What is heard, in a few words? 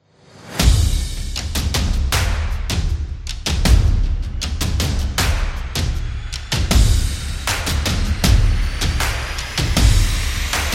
Sport Drumming Fast